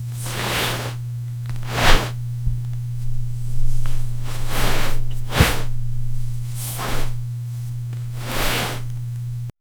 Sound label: she wraps him with a, cloth